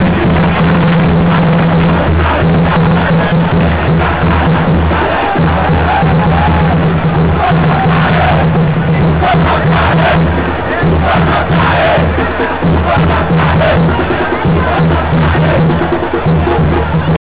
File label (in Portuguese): gritos; nautico